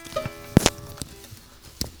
guit E3

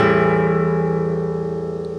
guit A min

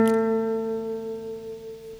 guit A2

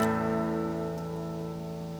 guit A maj

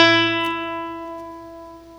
guit E3a

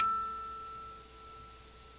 guit E3a harm1

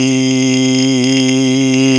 voice E

